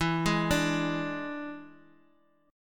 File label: E 7th E down; E7 arpeggiated; JGuitar